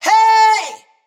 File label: adlib "Hey", wet vocals; Slate Digital Omnivox Vocal Sample Pack; Adlibs